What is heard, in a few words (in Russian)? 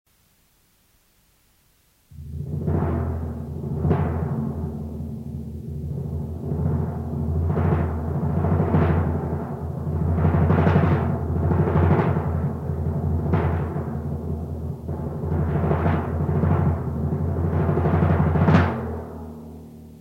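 предупреждающие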